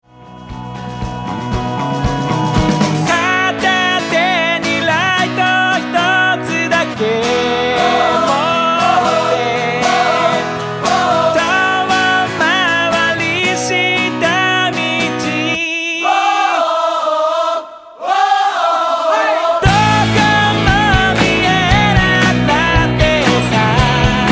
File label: orche